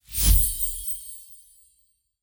christmas magic positive coin treasure touch sparkle; glitter